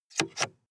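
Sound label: sports car interior ventilation adjust hard double; verkehr transport; autos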